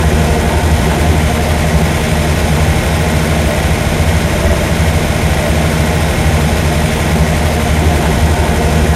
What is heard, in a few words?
V6T idle; AOS F1 2022